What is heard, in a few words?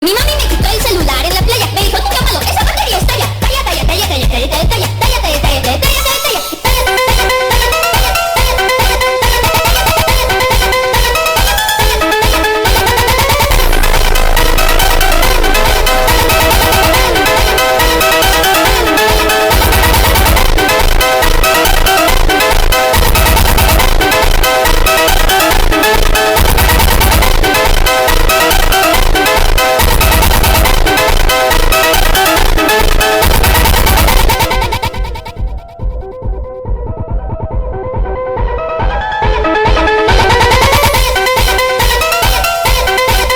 phonk